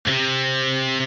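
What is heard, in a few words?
guitar; TamTamMicro.activity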